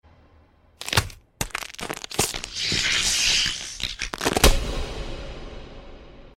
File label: Breaking Neck